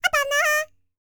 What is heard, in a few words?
C ASIA, World Voices